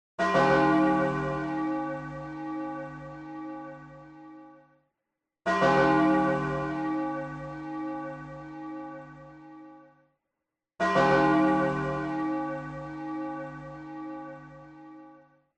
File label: clocktower; cave